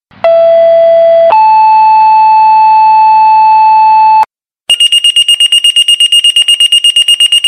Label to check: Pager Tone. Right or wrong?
Right